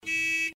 hupe

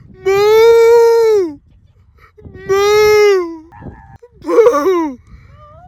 muh; ritter sport

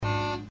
hupen

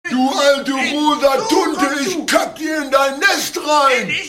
handy klingelton

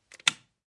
schalter

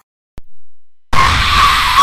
junge schreit

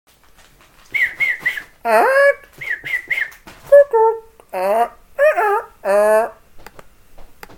papagei piep

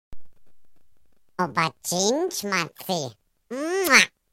kuss

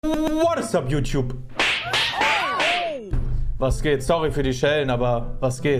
backpfeife

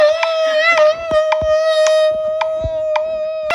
schrei

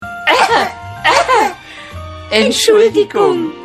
husten entschuldigung

